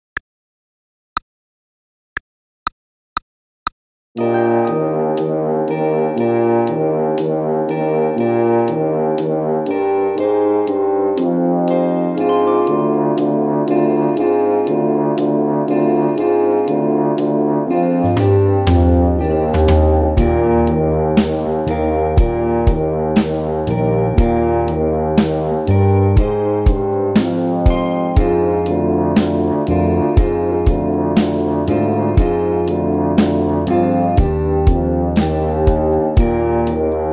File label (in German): Tuba Melodie; tuba fun vol 1